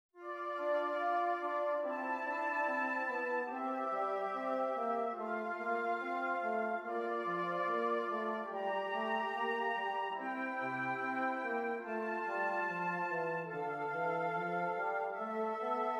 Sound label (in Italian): Esercizi di contrappunto a tre voci; Dritte Gattung; Nottebohm pagina 51 52